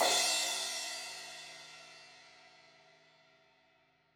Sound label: Crash 16in edge, Cymbals